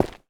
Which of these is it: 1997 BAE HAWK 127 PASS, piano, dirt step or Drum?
dirt step